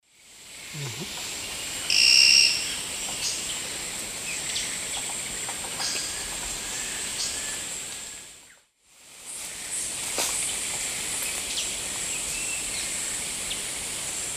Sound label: Lophotriccus vitiosus, calls; S. America; Eastern Lowlands